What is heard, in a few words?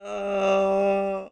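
run away; ゆめ2っき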